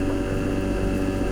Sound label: overview map pan loop